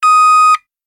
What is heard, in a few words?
truck bu lp, machines; ambient